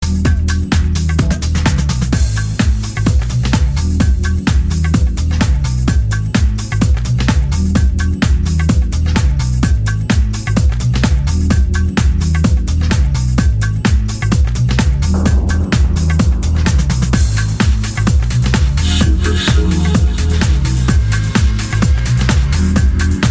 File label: background music tone ringtone